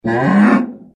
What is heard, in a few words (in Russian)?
rezkoe odinochnoe mychanie korovy